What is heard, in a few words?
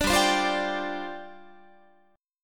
G 7th Suspended 4th G down Guitar; Listen to G7sus4 strummed; G7sus4 Chord